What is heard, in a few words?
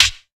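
snare drum; AKAI S6000 CD-ROM - Volume 1; DRUM RYTHM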